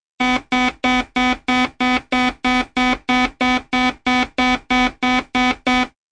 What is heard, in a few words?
Beeps; 헐리웃특수효과음; 신호-경적